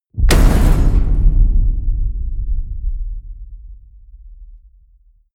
metal crash ring large

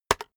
keyboard external enter hit very fast

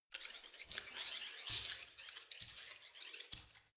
active organism

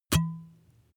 glass bottle drink stop soft

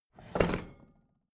bathroom drawer open heavy

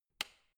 switch turn on

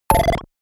sci-fi notification

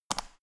power button turn on off hard fast

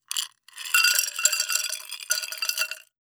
filling a glass with ice

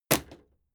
impact sweetener wood hard

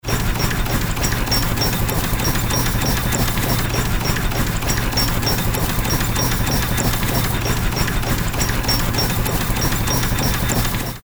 steam engine

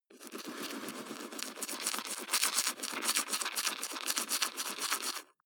teeth brush wet closed front

